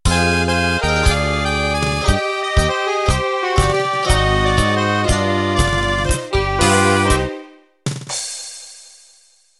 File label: Tango Italiano Intro